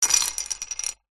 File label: bottle lid caps metal beer x8 down on counter